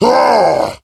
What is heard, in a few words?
VO Glutton Heavy Attack; battlerite